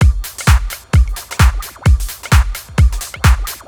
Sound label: Drum Loop 130, Downtown House; Drum Loops 130bpm